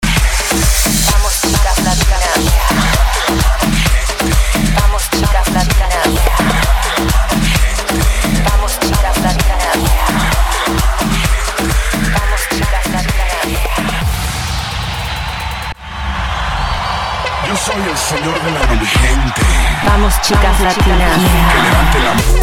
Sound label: 130 BPM